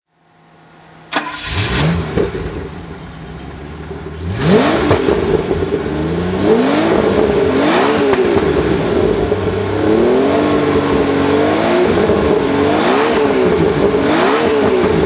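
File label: jaguar etype v12 convertible throttle, engine sound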